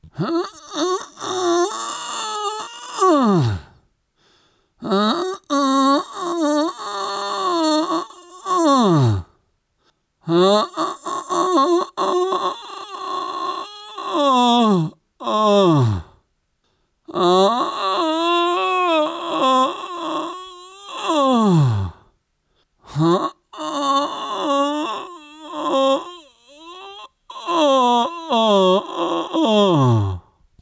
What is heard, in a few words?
Poop Pushing